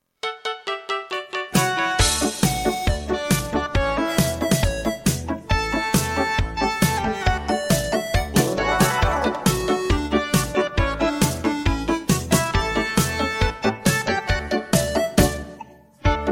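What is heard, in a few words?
backing track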